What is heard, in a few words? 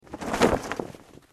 Landing